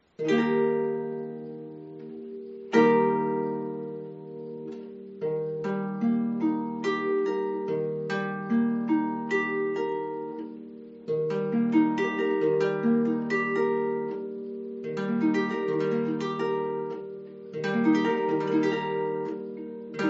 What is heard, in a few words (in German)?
Arpeggio